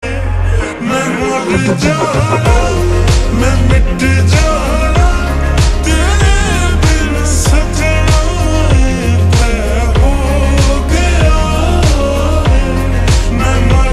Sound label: Ringtone, slowed and reverb